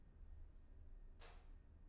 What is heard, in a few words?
keys; IEEE AASP